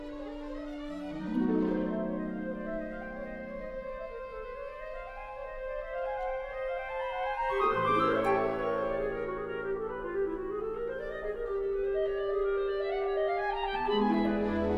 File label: fantasia for alto saxophone and orchestra